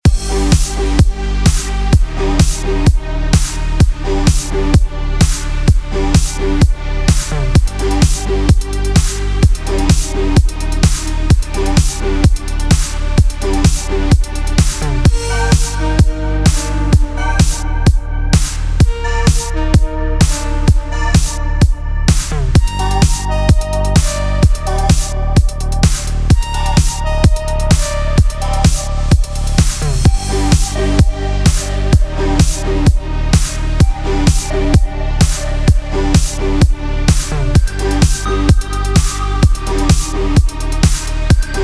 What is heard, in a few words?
Miniboss Fight 128bpm; wildcat gun machine ps4 switch windows xbox one gamerip 2022